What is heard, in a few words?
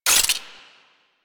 Zoom In; Weapons; Female Sniper Rifle; Vulkar; Grineer